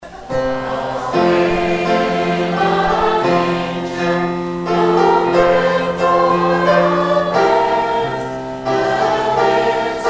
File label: away in a manger; guide christmas